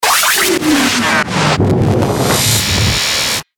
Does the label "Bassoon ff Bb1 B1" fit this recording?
No